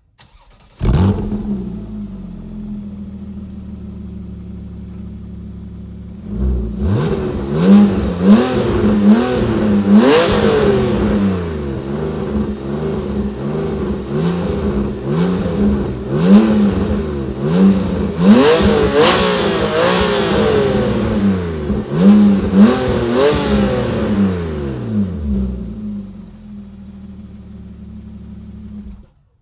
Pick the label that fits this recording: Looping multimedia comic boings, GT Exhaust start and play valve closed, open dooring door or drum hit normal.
GT Exhaust start and play valve closed